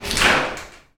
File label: household shower door open distant reverberant